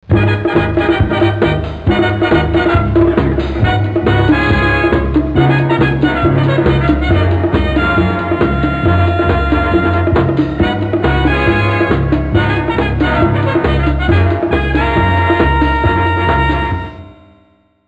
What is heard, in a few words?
Car Cue, Game Show Sounds